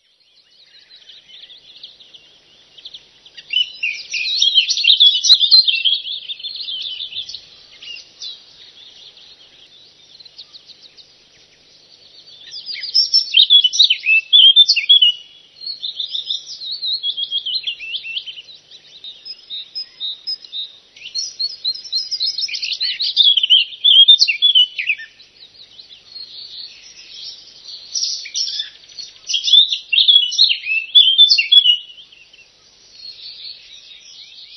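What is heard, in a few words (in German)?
Mönchsgrasmücke; vogelstimmen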